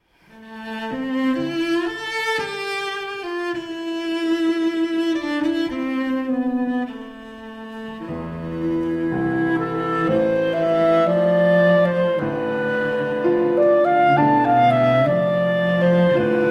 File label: Trio; Brahms